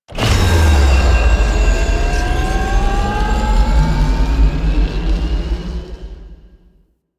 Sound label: Gen Power Up; Venus Landscape Deck Twelve; Warframe; Ambience; Venus Open World